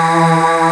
AAH A1, vocal, pop chorus; VOCAL ORGAN

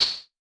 HH, Vermona Mono15 Drums; Stereo layers; Swordfight

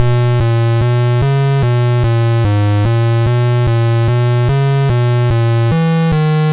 Apple II Bass, Chiptune; Sonic Foundry; Sony Creative Software

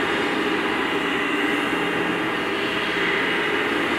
sparse soundscape samples; ReLooped

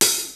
XR-10 K+SN+H, drums

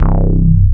BASS, Drum & Bass, 160 BPM

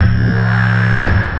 Acid Bass

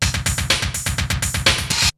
LOOPS, TECHNO; Syntec; Wall of Sounds; TECHNO-BOX

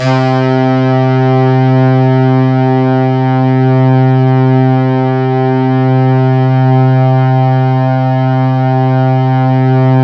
C3, Synth Line; 90 s Sample CDs; NiceBeats; SynthLine VOL-27

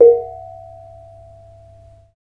BONANG BB4P; Spectrasonics; Heart of Asia

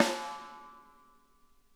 snare, drum kits

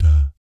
DAT D2, Vocal Planet Jazz & FX; ILIO; JAZZCOMBO

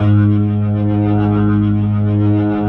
G#, The Piano; InVision Interactive

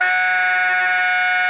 SOPRANO F#4, Saxophones, SOPRANO GRWL; Greytsounds; Philip Wolfe David Crozier's Saxophones

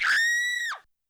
Scream, YAMAHA RM1X

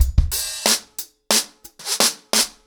90 BPM, acoustic drums, drumloops

